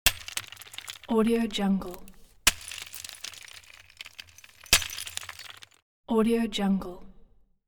sledgehammer rocks

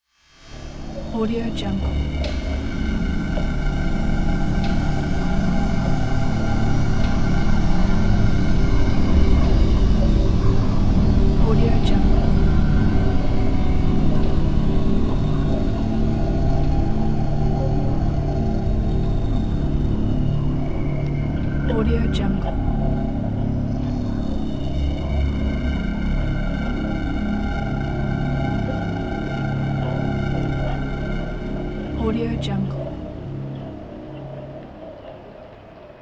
drone

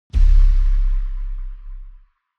sci fi vehicle drive off metal

